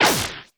Blaster Fire, cartoon Foley Fx

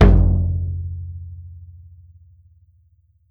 drum, mixed drums, World Instruments; Best Service ProSamples vol.52 - World Instruments 2; AKAI

